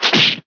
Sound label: slap punch sound